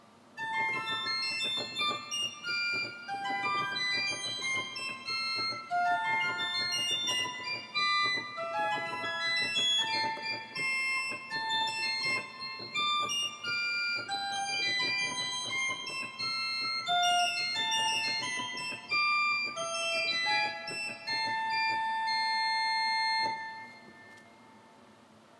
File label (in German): oktave, orgel